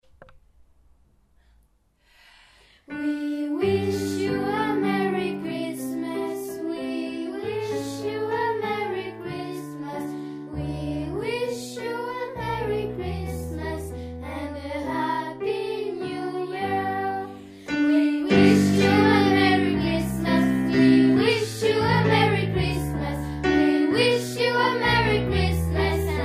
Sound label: We wish you a mary Christmas, atelier vocal; de part des jeunes de l'atelier vocal